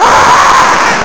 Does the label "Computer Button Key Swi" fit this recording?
No